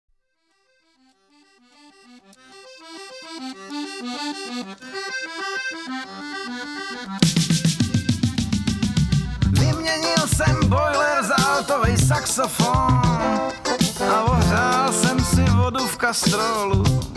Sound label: Blues